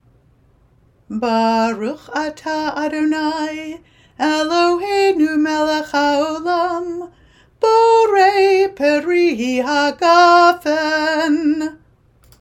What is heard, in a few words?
Kiddush Borei Peri Hagafen, Group Songs; kulanu joint friday night